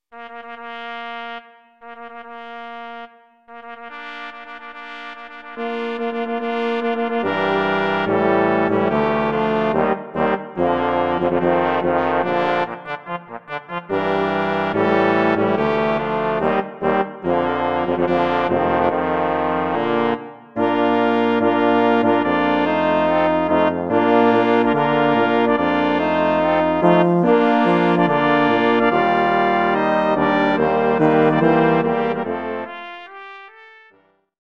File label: Wedding March